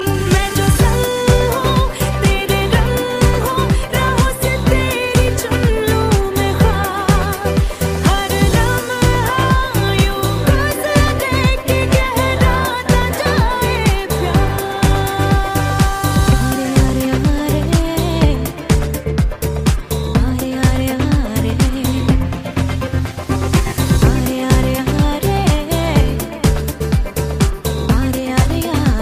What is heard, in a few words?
Remix